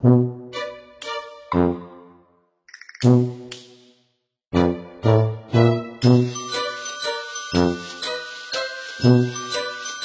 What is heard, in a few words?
Dark Jazz Waltz; Scream Collector